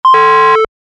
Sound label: warn